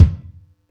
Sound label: Yamaha EX-5